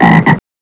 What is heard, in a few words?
frog